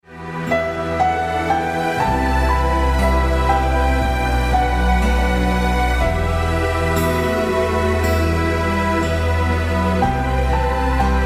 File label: Sad Anime